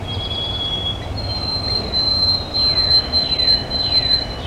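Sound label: drawn-out chirping of a sparrow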